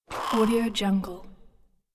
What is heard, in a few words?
negative point sfx